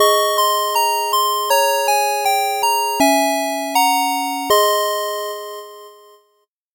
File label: 8bit jingle normal